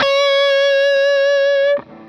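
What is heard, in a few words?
fuzz guitar vibrato; MAYFUZZ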